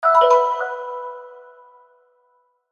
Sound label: Logo Xylophone Reverb; Sonic Logo Sound Effects; Production Element Imaging Element Accent Transition